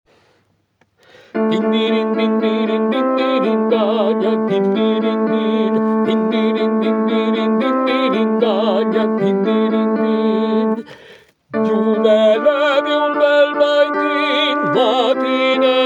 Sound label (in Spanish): tenores; Dindirindin